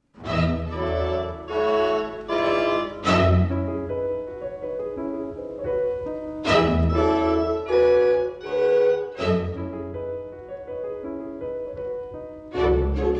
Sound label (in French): CONCERTINO POUR PIANO ET ORCHESTRE; HONEGGER